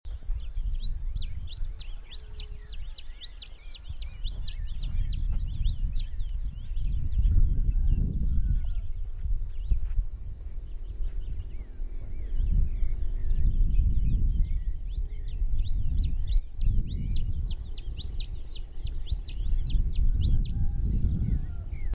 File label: Donacospiza albifrons